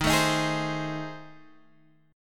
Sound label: A Minor Major 7th Flat 5th